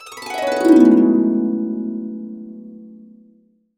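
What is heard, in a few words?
E major, Complete Harps; USB Soundscan vol.62; AKAI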